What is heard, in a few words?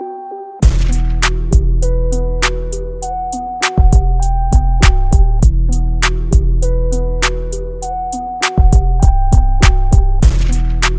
instrumental